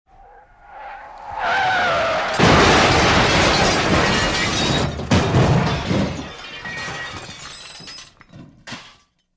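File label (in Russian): звук аварии с ударом и переворотом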